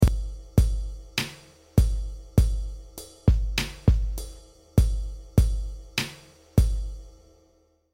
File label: lofi drums